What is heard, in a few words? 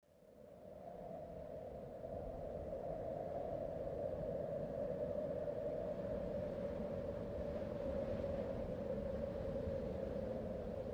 long breeze